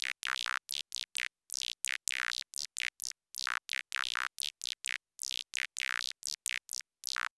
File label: Anabeat Blip; Sonic Foundry (Sony Creative Software); Prototechno